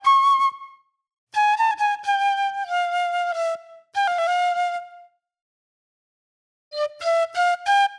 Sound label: Shrill Flute